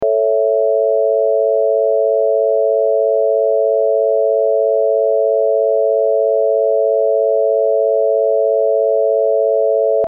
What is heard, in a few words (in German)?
Dur-Dreiklang